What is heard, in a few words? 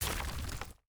block destruction stone